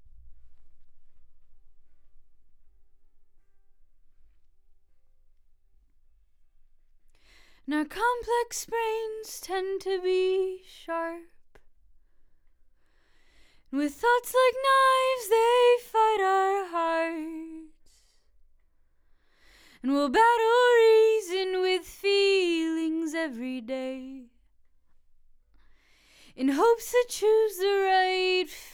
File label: Vox